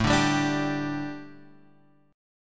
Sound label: A 7th Suspended 4th A Guitar Drop D, chord